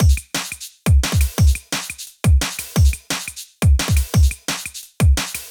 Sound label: DnB Showoff 174bpm